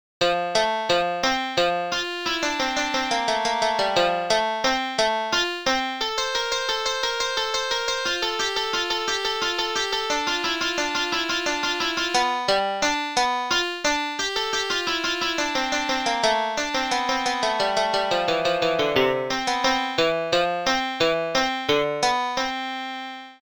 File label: guitar, 88 bpm; Invention No. 8